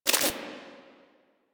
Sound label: Screen Glitch; Player; Warframe; Mini Games; Caliber Chicks Minigame